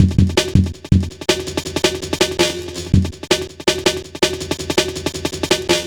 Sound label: Funki, Jungle, Drum Loops 163.5; Zero-G Jungle Warfare 1, 2 & 3